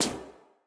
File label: block hit; CrossCode; battle